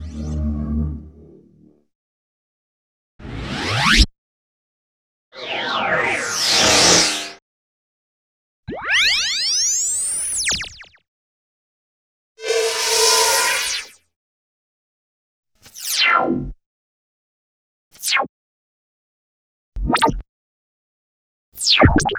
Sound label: Swells & Rushes Assortment, Electronics; Sony Pictures Sound Effects Series Volumes 1-10; Sony Creative Software